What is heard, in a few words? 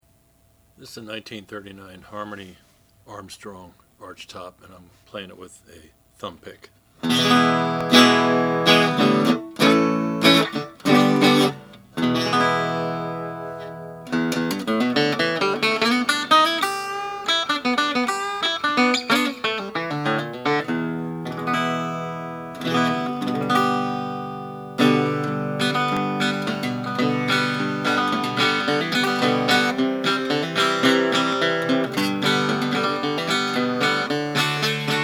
harmony armstrong tobacco sunburst